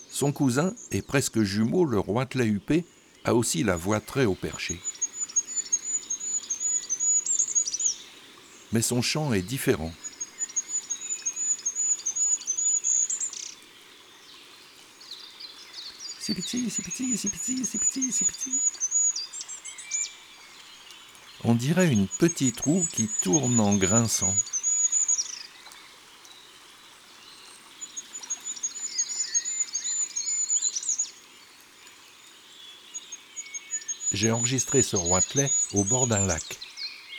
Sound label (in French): Le Roitelet huppe; Automne; Les 4 saisons des chants d'oiseaux